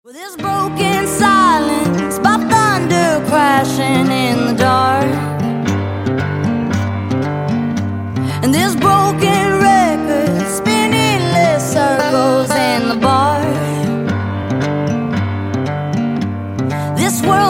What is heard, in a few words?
acoustic version